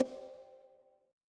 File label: ui click